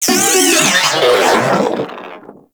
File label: Moa Vocal Death; Warframe